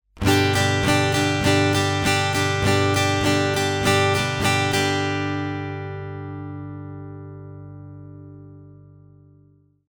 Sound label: Strumming, guitar